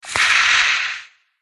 scorpion attack voice bottom

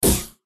jelly fish boss attack contact bottom

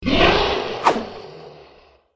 dead pirate boss attack voice up

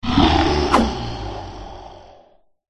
dead pirate boss attack voice

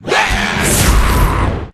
Pet Troll

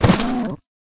Wound

